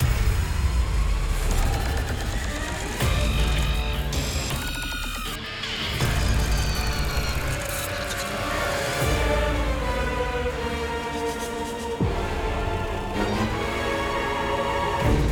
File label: Battle Boss Giant Arachne